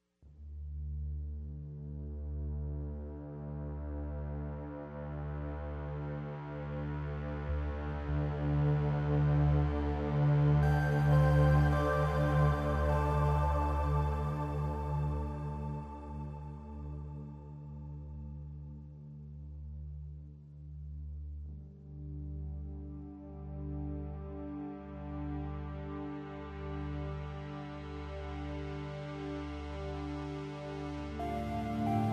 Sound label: ambient